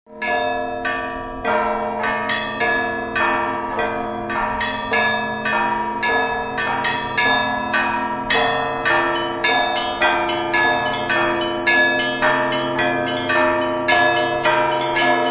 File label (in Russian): rojdestvenskii kolokolnii zvon